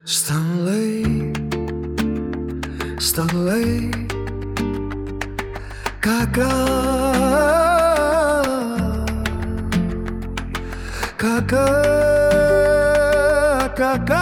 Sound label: flamenco